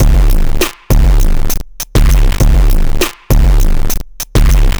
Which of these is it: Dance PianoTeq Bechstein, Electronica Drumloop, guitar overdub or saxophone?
Electronica Drumloop